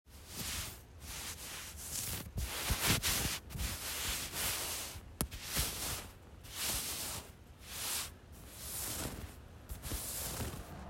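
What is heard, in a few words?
Clothes Shuffling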